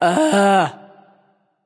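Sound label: ugh, female; smite; voicepack; hera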